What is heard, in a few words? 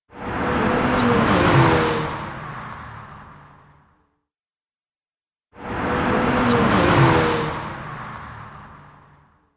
turbo car fast pass by